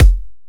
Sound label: kicks; KrossedOut; RoBKTA Sample Pack Supreme